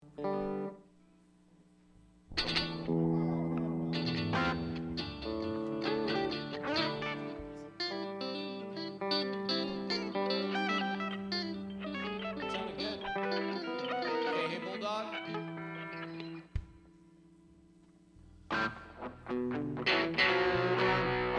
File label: Strings Mix